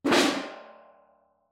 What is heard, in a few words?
metal trash lid bang; soundscrate